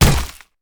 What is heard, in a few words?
mace